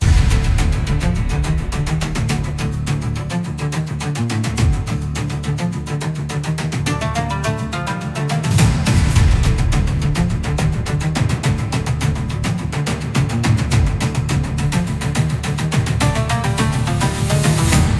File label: Thunder Gate Pursuit, cinematic japanese style battles